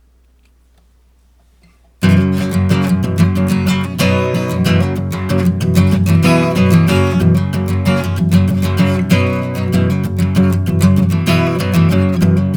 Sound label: Acoustic Guitar Jam